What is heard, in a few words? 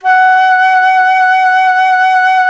FLT, WOODWINDS; Roland - LA Composer vol. 1